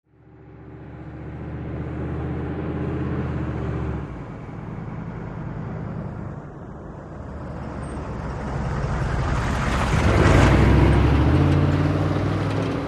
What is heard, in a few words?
Humvee Slow By Gear Sh